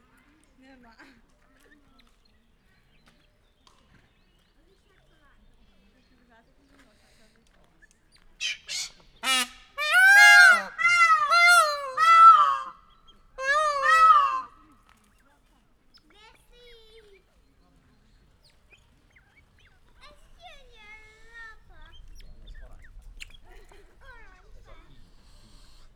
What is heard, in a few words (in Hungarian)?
rikoltó madarak, páva; felsolajos zoo 2015; cardioid